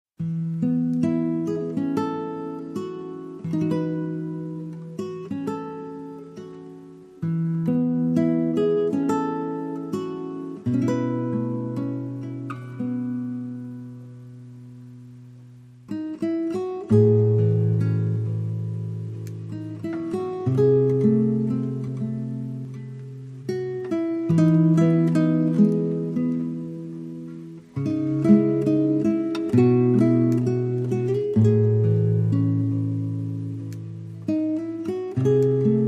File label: Guitar Only